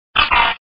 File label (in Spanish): Grito; Cacnea